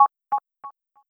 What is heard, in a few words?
Success